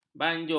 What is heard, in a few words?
banjo